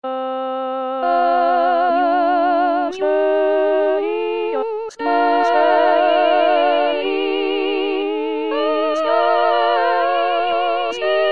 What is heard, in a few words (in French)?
Agnus Dei Femmes; La Messe O magnum Mysteriumde Tomas Luis de Victoria